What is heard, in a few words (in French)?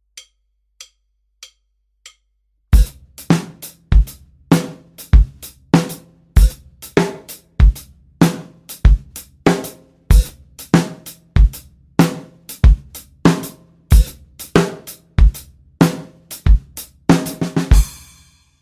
rythm, Drums; MusicRadarFrance; A vos baguettes